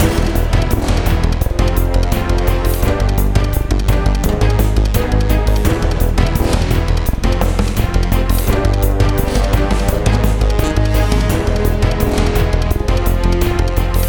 Hangar Escape loop; mech skeleton windows gamerip 2017

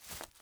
foliage step; teardown; ps5 windows xbox series xs

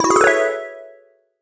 Waterski Jump; Levels Hub Bayou 02 Twitch Waterski; Waterski Jump Success

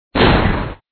Enemie Destroyed; Tails Nightmare 2

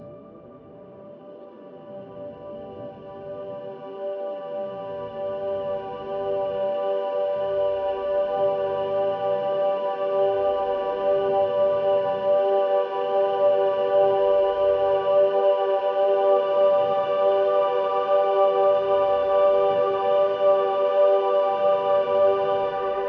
Lazily Loop Ambient 85bpm; jenny leclue detectiv

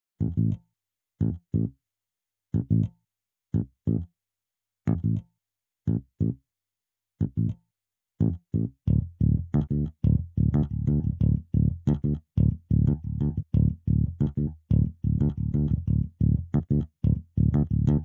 Elec bass; super crush k.o.

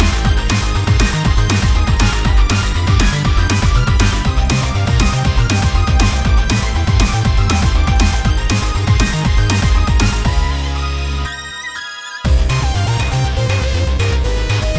Stage Loop

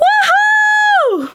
Emote Cheer; Twitch Skijump

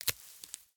foliage break

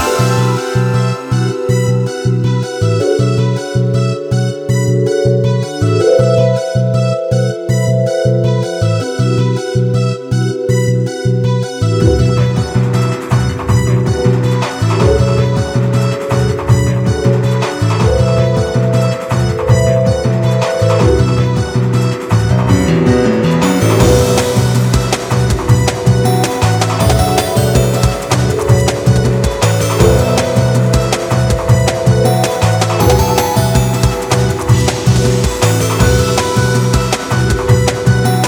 BGM STAGE

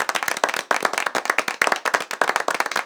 Applause Medium Looping

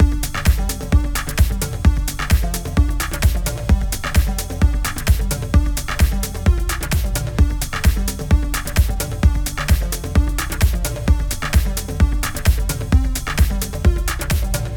Music Loop; Dino Galaxy Tennis

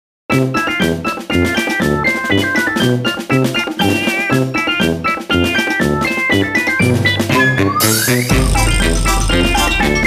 Barcode BGM; Taiko no Tatsujin 8 2006; PS2

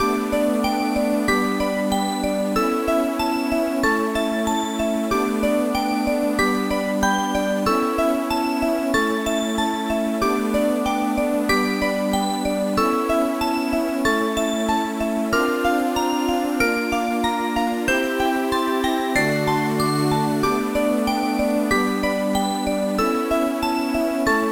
BGM TOWER

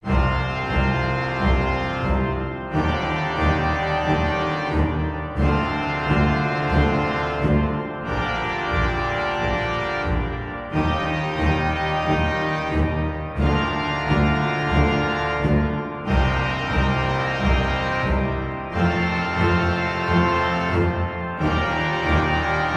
Music Battle result win; Bloodmasque

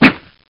SFX CLEAVER

